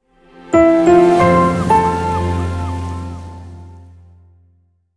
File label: Sound Logo; TUI